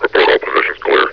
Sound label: laugh, hgrunt; scmod